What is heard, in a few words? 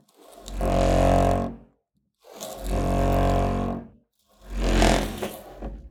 Blocked Door Creaks; SoundBits; Unsettling Creaks and Squeaks – Extension I